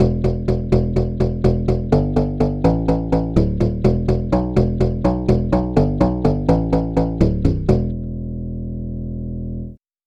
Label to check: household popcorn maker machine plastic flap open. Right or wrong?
Wrong